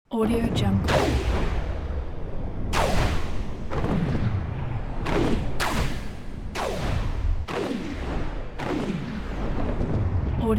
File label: missile canonade ambience